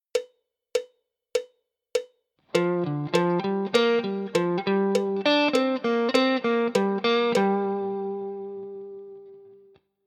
G minor pent lick, guitar

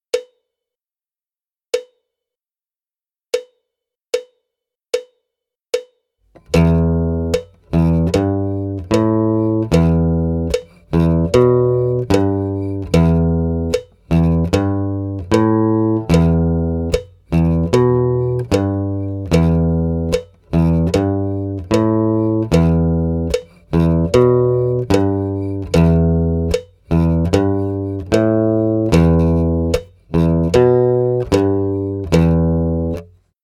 Riff Acoustic, guitar

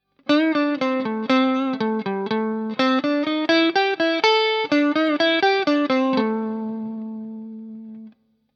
Minor Blues Scale Lick, guitar

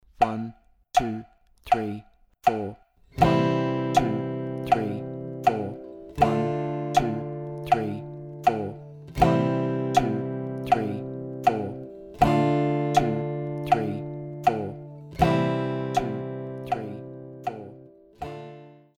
Basic Guitar Chords C Dm

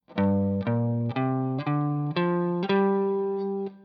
G Minor Pent Comparison, guitar

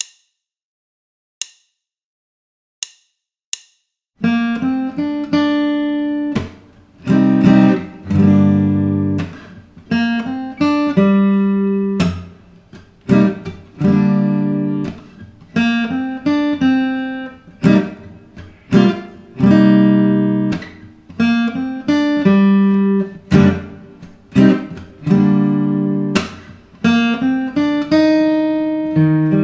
Minor Bar Blues, guitar

